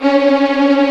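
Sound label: Vio tremolo C#3; Digital Sound Factory Virtuoso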